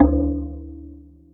Balinese C2; Greytsounds; Scott Peers Sound Engineering

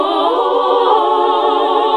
SING, CLASSIC VOX1; Best Service; Hallelujah

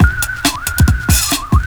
FXBEAT; Big Beat Drumloops; FX BEATS; AKAI

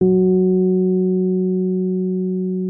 Rhodes MK1 F#3; Xsample VOL-1

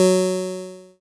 ELKA, String Machines; ELKA RHAP

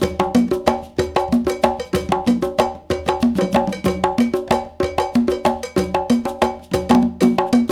Bembe 6 8, World Dance

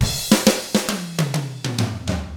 ROCK, Drums; ILIO; Double Platinum Drums 1

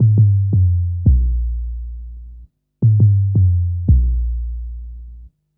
Hammer Bass, Drum & Bass; Fluid Dynamics

